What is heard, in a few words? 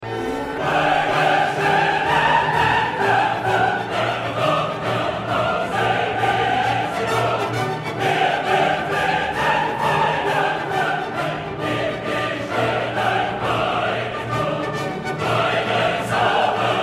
major mode